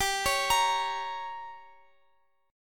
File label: G Diminished, Guitar, Open F; arpeggiated; x,x,x,14,16,17